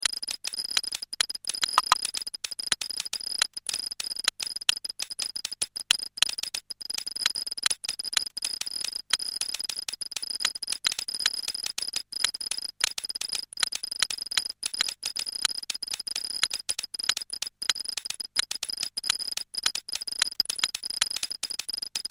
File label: DD10 stream; drumcomputer; Randomstream 4